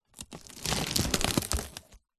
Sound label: wood split crack break splinter tear